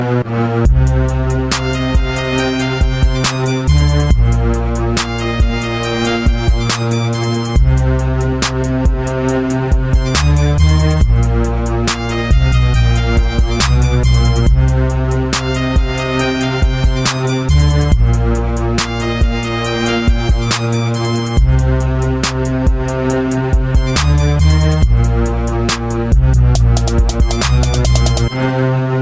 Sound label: trumpet